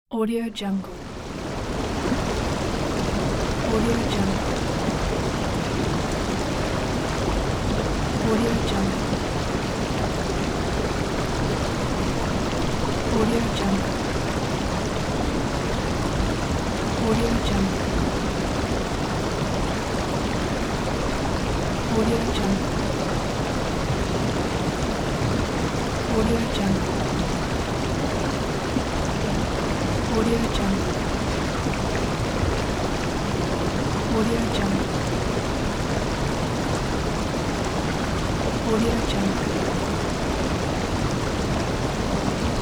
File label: river